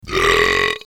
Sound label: brap; I belched that all out at once, one take, no breaks.